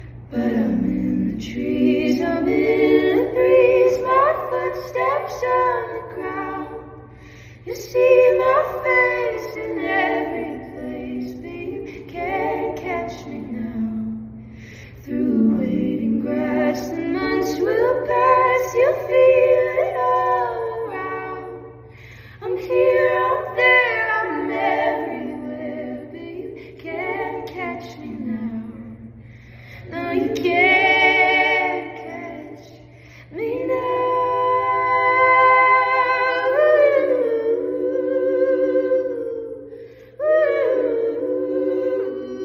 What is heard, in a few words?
acapella version